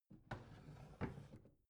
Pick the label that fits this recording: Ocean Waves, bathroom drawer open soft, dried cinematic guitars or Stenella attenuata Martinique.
bathroom drawer open soft